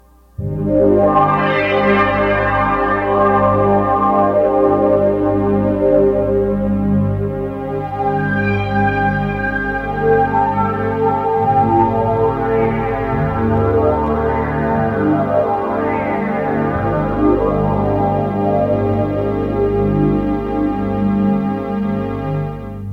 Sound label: Synthesizer parts